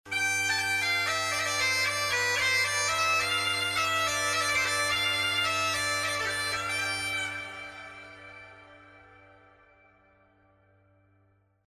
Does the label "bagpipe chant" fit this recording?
Yes